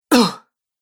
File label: Female Fighter Grunts; Grunt Sound Effects